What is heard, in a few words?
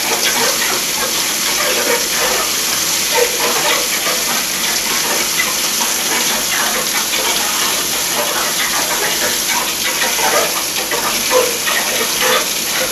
boiler